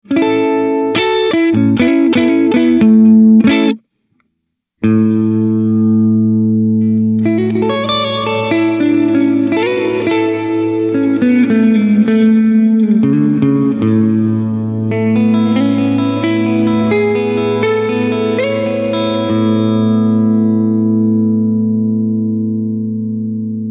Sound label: Hall Modern Big